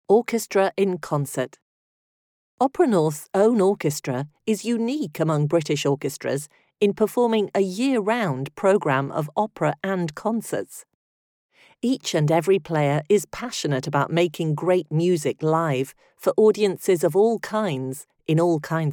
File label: Orchestra in Concert